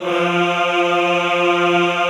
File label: EHH F2, Classical Choir; Best Service